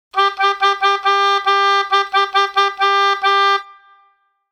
hobo ritme

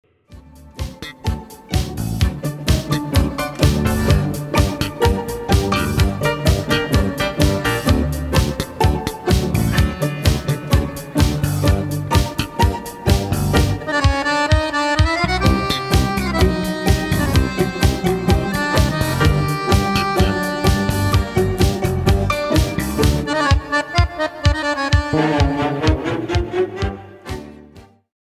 dansmuziek

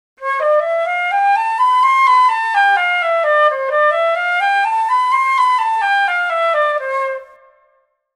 vogel vliegt

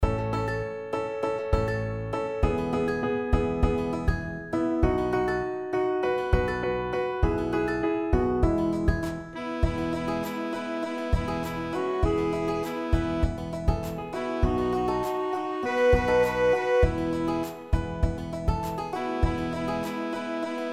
zonder zang

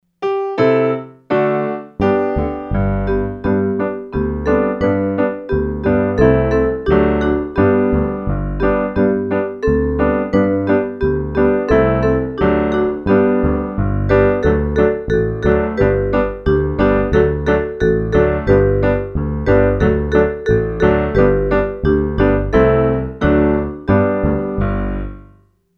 zonder zang

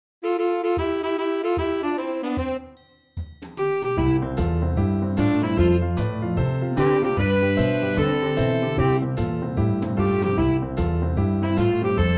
de dierentuin zonder zang

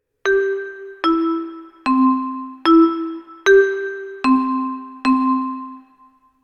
viertoonladder

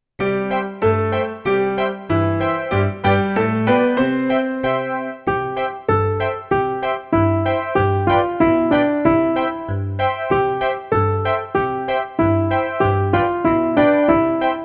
zonder zang; Genre: Blues

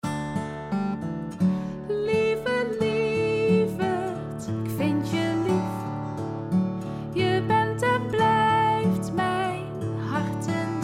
lieve lieverd

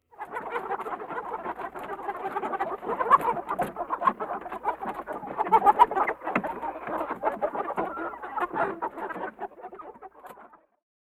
dierengeluid

